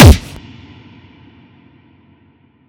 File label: punch